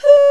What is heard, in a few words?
VOX WHO D5, Scats Choir; 90s Sample CDs; Club-50 - Foundations Roland; Short Scats